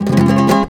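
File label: RASGAND D major, FLAMENCO Guitars; Spectrasonics; Hans Zimmer Guitars VOL-2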